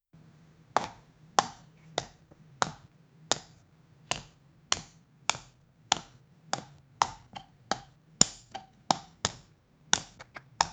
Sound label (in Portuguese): maquinas de academia, fitness